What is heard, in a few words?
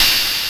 NOISE CYM; PlatinumPhattCD1; E-MU Producer Series Vol. 8 – Platinum Phatt; Phatt Combo